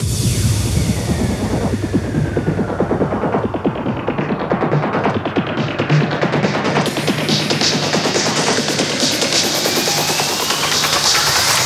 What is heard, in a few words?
140BPM 8Bars, HandsUp & Trance Fills; SpaceRace; Vengeance Samples